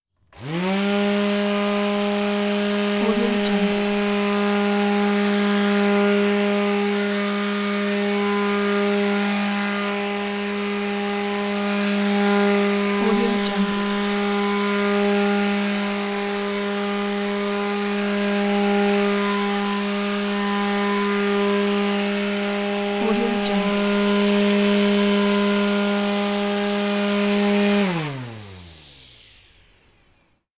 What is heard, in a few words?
grass trimmer